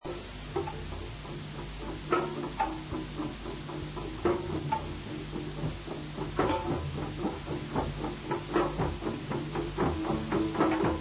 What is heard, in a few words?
drums flutes